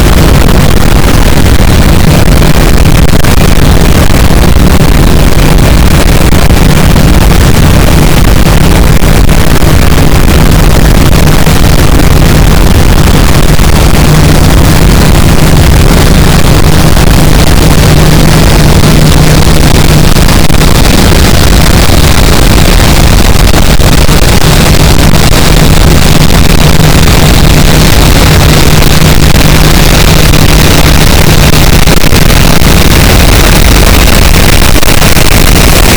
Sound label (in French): cachalot negatif, boat, Physeter Macrocephalus